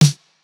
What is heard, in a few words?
japanese pop snare